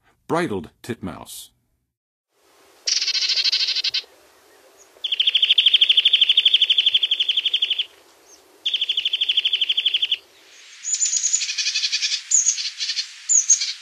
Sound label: Bridled Titmouse, Songs of Birds; North America; Western USA; Western USA Songbirds